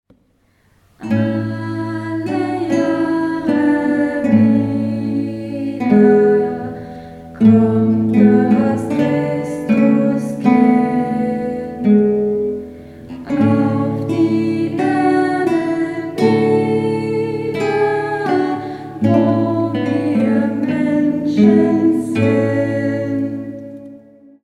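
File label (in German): Alle Jahre wieder, Gitarre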